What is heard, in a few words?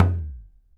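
KHOL HEAD, DRUMS OF IND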